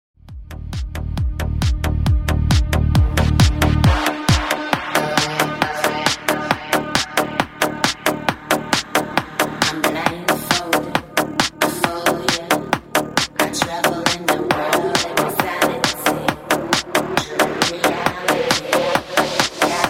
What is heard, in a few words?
psytrance interactive noise tracks